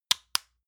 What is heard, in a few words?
lotion lid close medium; berufe